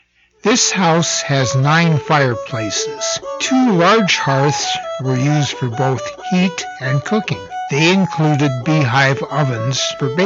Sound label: fireplaces